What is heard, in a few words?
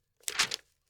Paper Flip Agressive